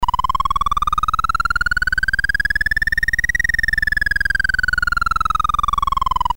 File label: Music Game BASIC, sid MOS6581R4; hvsc